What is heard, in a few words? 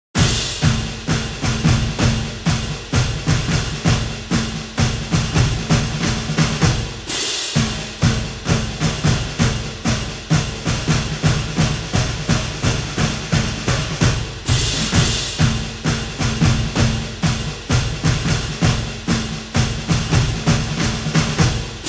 Drum Roll